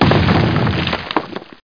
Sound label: shatter; HEXEN2